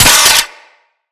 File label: Hand Rocket Launcher Mech; Weapons; Corpus; Crp Hand Rocket Launcher